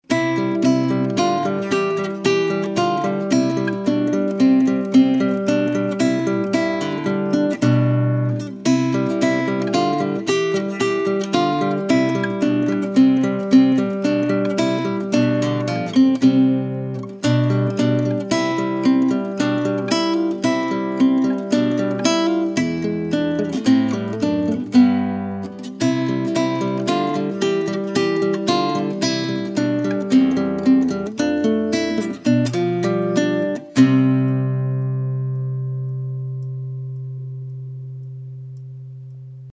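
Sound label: Classical Wedding Ceremony, acoustic guitar; Ode To Joy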